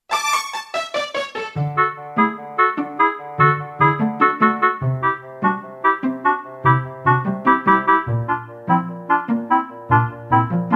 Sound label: house; majoras mask; n64